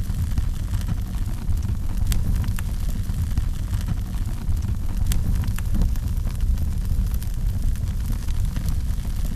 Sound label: fire loop small flame; colony; Titanfall 2